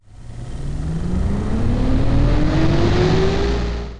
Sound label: impulse start, engines; osirion